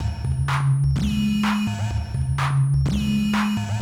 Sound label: ACID JAM; Best Service Advanced Media Trax Building Blocks